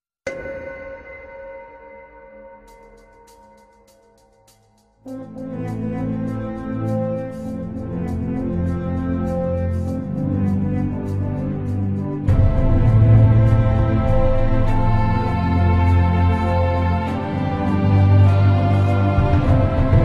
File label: orchestral theme; streetfighter2; romanfourquin; guile